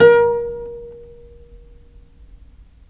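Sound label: VIOLE PIZZICATO A#2, Historical Instruments; USB Soundscan vol.23; AKAI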